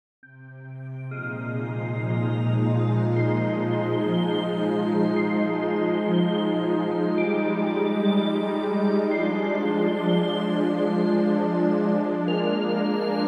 Ambient Soundscapes Electronic Space

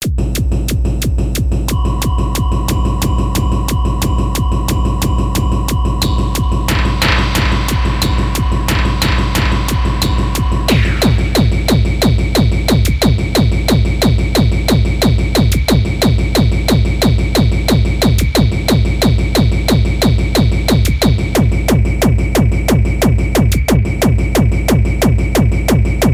techno

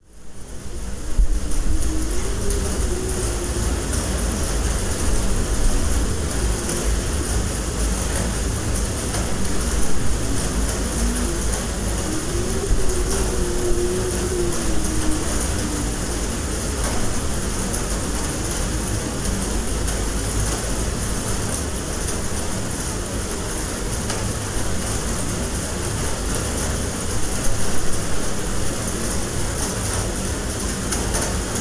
Ocean Sounds Nature and Rain, Cold November Rain

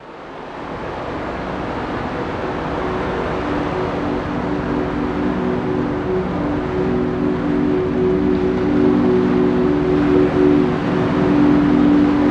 White Noise Rain Sounds; Baby Sleep

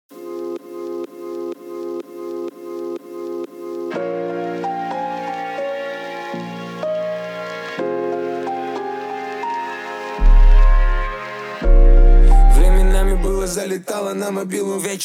33-37Hz Low Bass

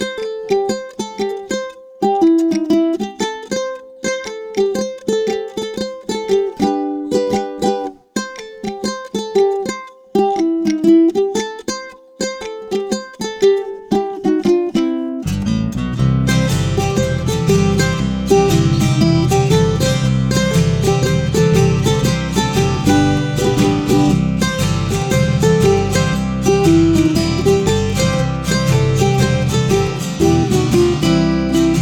Uke Blues

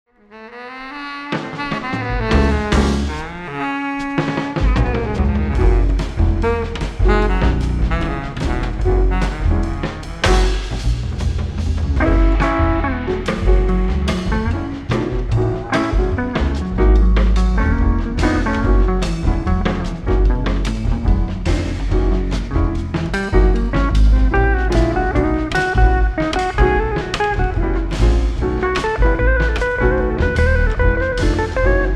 drum

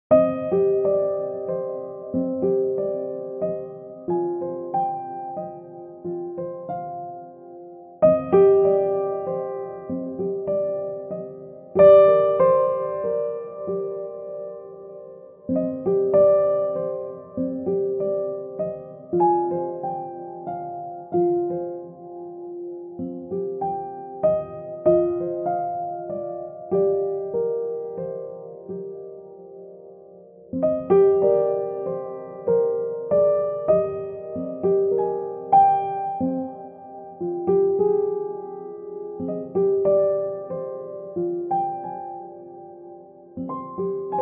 ChillHop Beats Classical Christmas Music

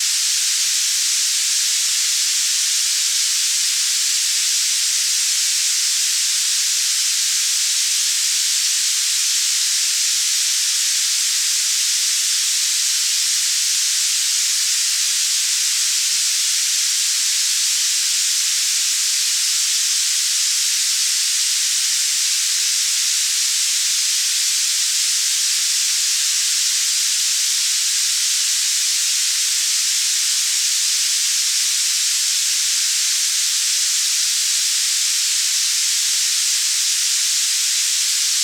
White Noise Steam

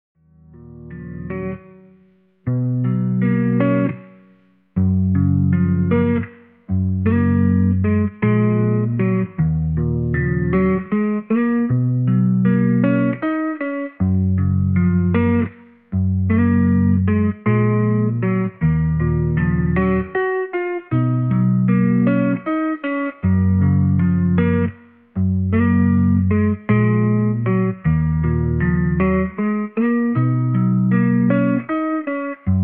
lofi

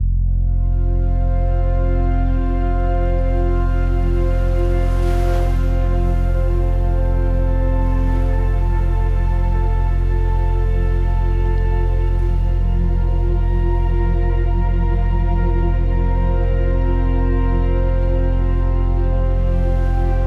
Chill out Music, Celtic Harp Sound

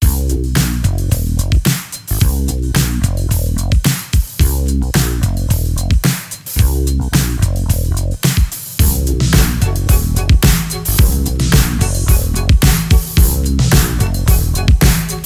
Freestyle Hip-Hop Beat, Rock Strings Hip Hop Mix

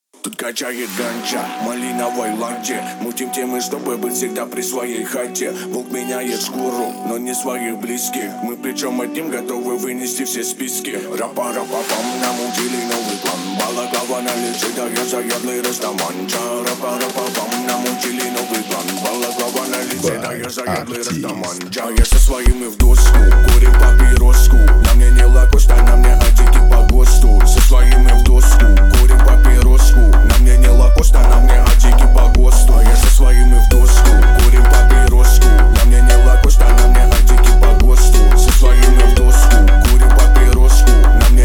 41-46Hz Low Bass

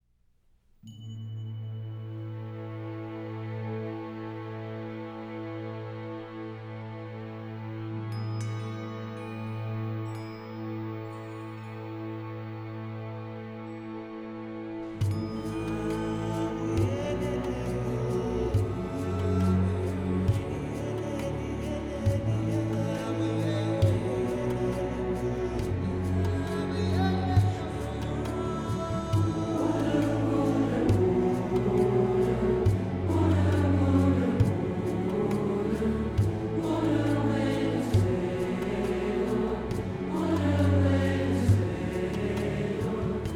Chorale

